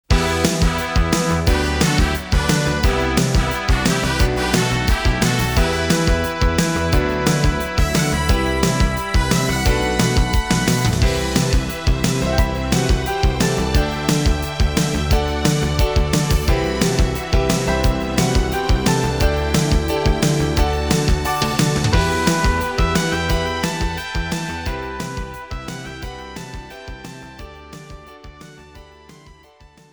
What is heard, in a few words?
Dungeon; Falcom Perfect Sound Catalog II